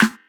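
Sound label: fat snare drum a key, tuned to the A note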